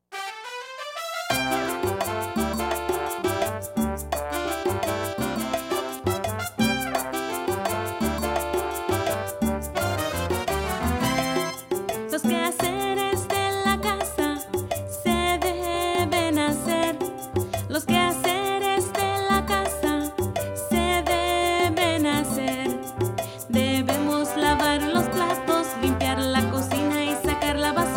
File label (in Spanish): Los Quehaceres (Vocals)